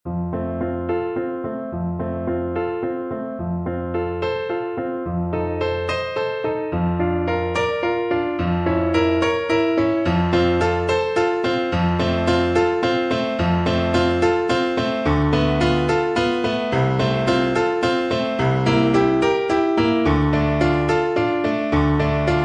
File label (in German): Viola Solo + Klavier-Playback; Ave Maria